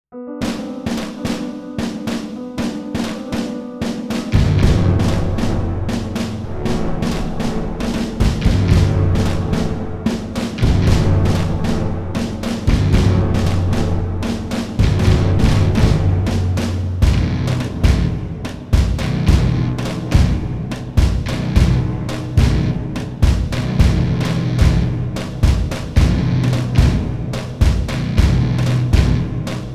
Combat Theme

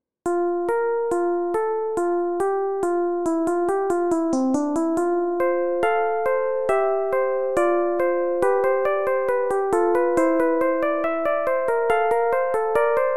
Fugue; Varun Sathya

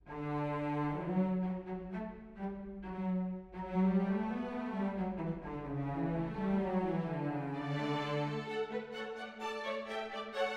Clarinet Concerto in D Major

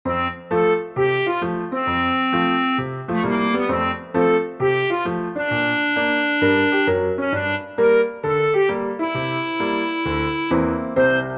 Jingle Bells Swing

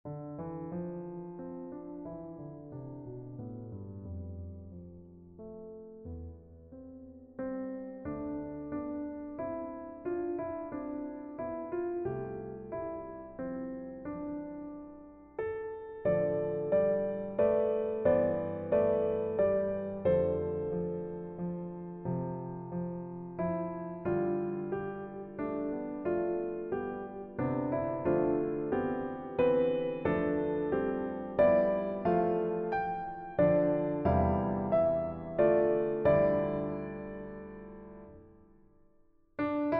Rain Prayer Pno

